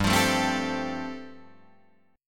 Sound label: G 7th Suspended 2nd Suspended 4th, Guitar Drop D